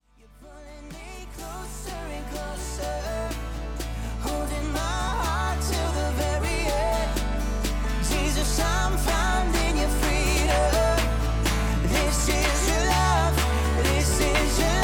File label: (acoustic)